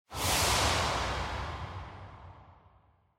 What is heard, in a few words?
Scene Flashback Long